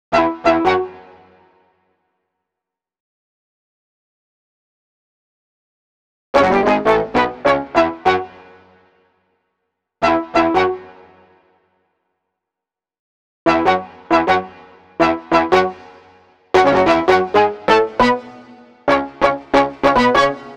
Sinthetik Horns; Cinematic Funk; Sonic Foundry (Sony Creative Software); Cinematic Funk Urban Tone Poems

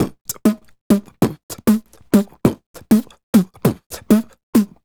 Beat Box, Hip-Hop and RnB Vocals

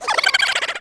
Laughers; SNES; Spawn

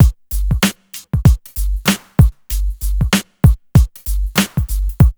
BEAT, Hip Hop R n B; AKAI; USB Soundscan vol.19

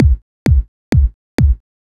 Kick, EDM; Sonic Foundry (Sony Creative Software); Afterhours EDM

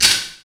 PER PROCU, Procussion

